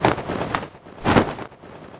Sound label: Harpy Thunder Walk